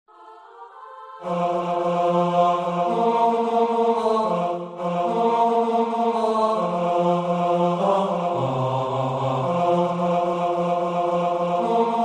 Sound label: Bass from Chorus